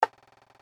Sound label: Paytable Next; slots; Majestic India